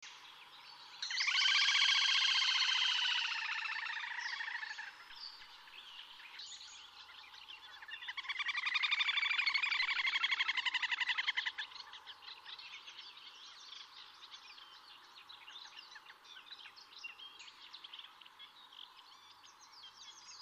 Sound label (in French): grebe castagneux